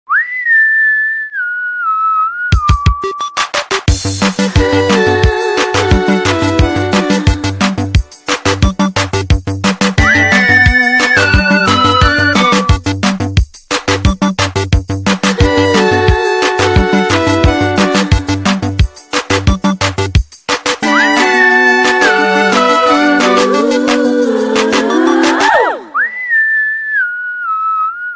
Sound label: nokia nana ringtone